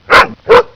DOG 2X; psion cd vol1

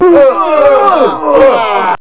YELLING; psion cd vol1

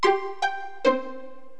PIZZ; psion cd vol1

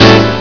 Short Accord; psion cd vol1

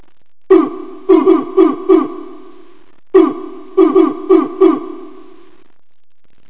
Uhh Rhythm; psion cd vol1

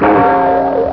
POIING

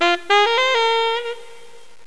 SAX

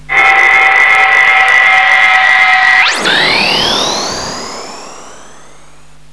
UFO; psion cd vol1

